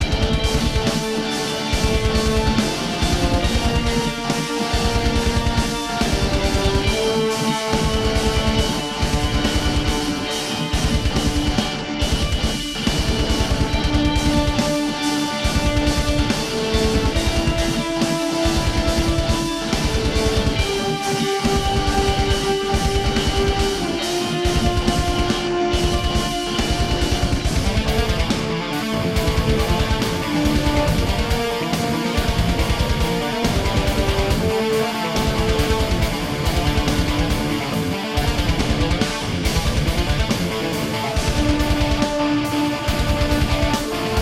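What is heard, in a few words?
Music Wasteland Battle Loop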